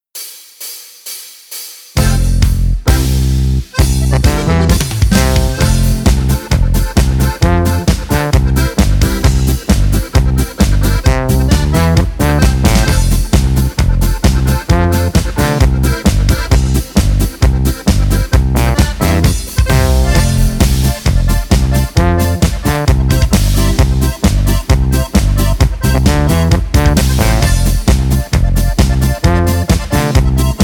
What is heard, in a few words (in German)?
Polka